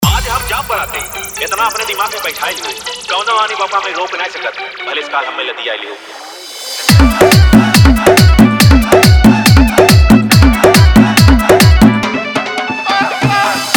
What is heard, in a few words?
Bhojpuri Remix